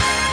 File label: stabs hits, Melodic Stabs and Hits